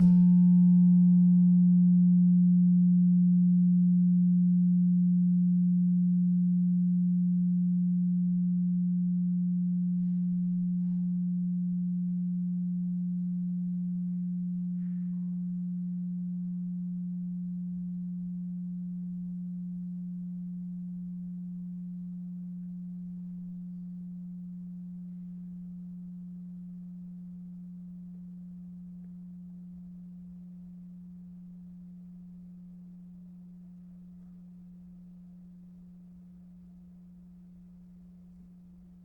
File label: Slentem 3F Sharp, gamelan tuned